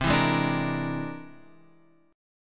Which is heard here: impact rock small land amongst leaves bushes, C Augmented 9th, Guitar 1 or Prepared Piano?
C Augmented 9th